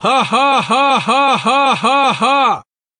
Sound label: haha